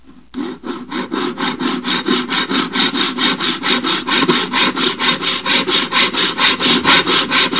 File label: SAW